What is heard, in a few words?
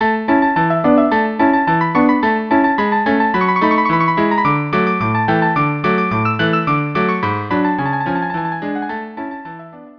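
violin viola cello; Prelude to act 1; carmen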